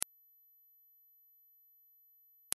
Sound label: mosquito repellent 12000; dengue